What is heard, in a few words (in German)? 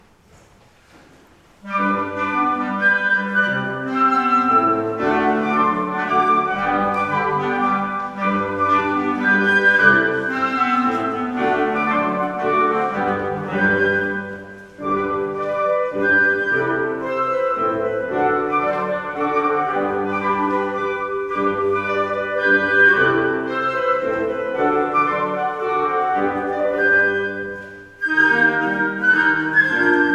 Mazurka; Mazurka aus Krenglbach